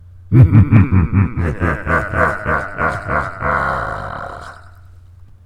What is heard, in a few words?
Demonic Laugh